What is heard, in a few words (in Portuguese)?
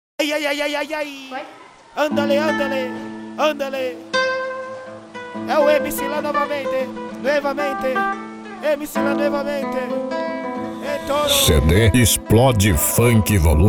explode funk